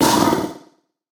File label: windup